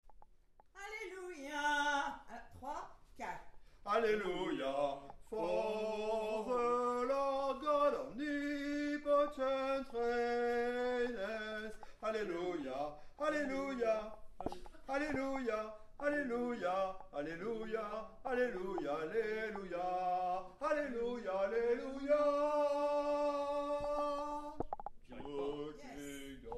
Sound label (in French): halle basse